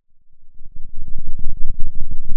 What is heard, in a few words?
Sound of a thunderstorm, center